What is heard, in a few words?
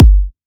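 Kick, G minor; Sample Banks; Percussion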